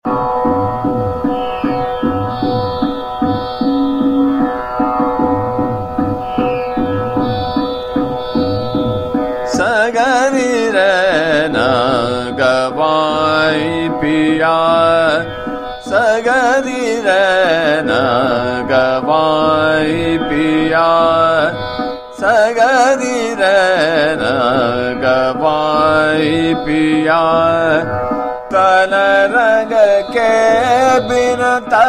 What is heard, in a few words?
Chota Khyal - Trital, Basant Mukhari; Sagari Rain Ganvai Piya